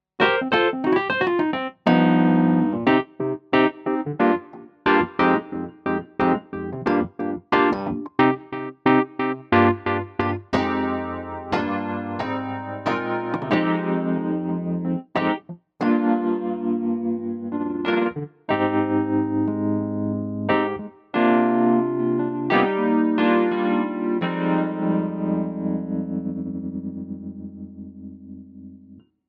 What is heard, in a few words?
Montage